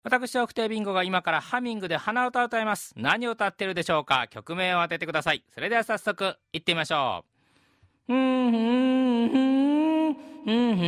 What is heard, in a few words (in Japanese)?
hanauta